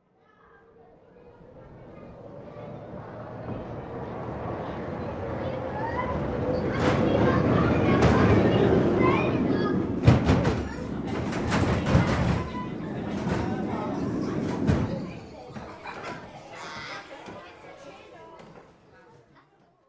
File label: pass by fun ride; sonniss gdc